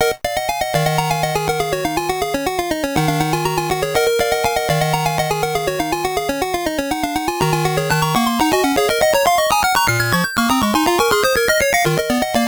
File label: Intro (Without Sound Effects); Samurai Shodown 2; Pocket Fighting Series; Neo Geo Pocket Color